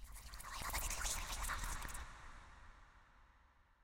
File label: Hotel Whisper; silent hill homecoming; ps3